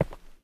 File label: ROCK, steps; Terrarum